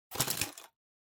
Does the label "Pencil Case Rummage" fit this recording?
Yes